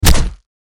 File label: hit; robocop